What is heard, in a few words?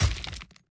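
player Gospel Damage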